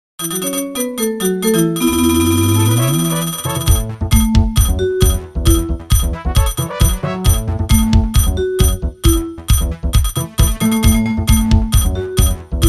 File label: holiday nokia 3120